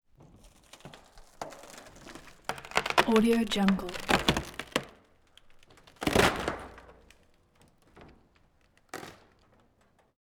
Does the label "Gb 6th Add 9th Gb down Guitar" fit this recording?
No